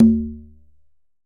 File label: Tumba C Sub Open, Conga, Latin Percussion; Drums; Drum Hits; Ableton; Factory Packs